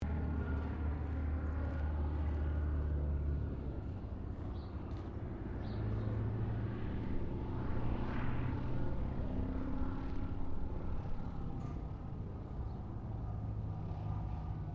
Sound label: AIRCRAFT CESSNA O-1 BIRDDOG TOUCH AND GO, spotter aircraft; Airplanes